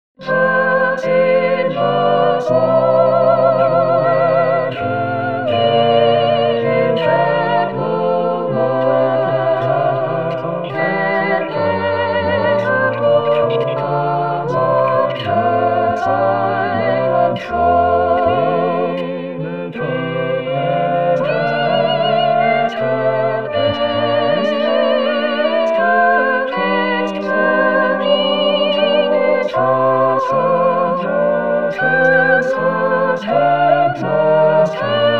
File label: Silver Swan All